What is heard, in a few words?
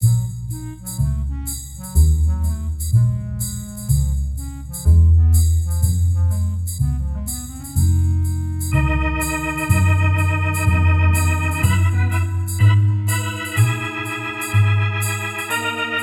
icy cold blues